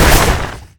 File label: attack impact; venomancer